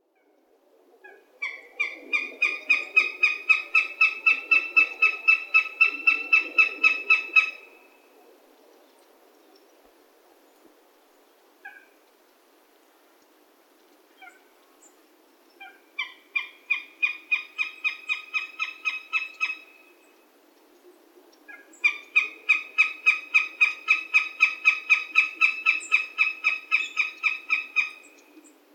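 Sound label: goshawk; ootaka; yoshimi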